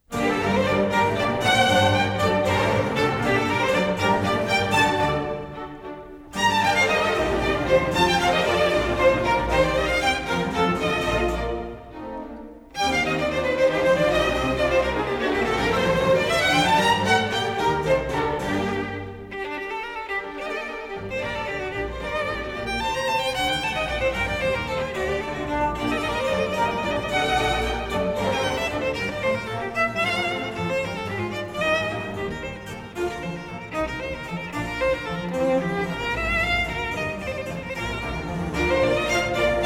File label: Concerto in G minor, Violin Concertos; BWV 1056; Johann Sebastian Bach